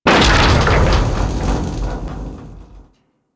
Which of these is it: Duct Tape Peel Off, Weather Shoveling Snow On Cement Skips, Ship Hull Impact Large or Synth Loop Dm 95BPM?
Ship Hull Impact Large